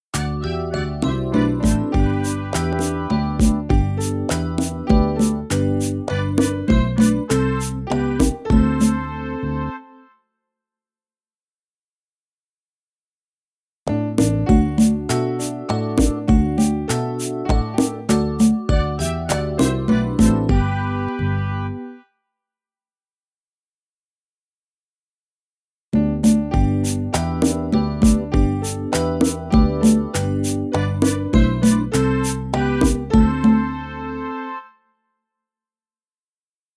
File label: Feeling Feeling Feeling Instrumental